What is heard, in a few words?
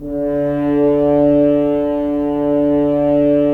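Solo French Horn; Roland - LA Composer vol. 4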